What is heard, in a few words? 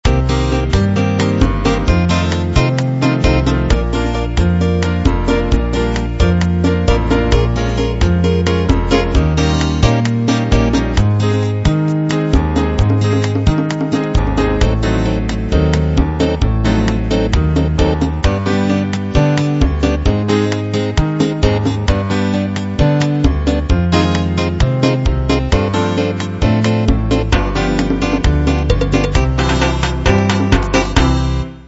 beguine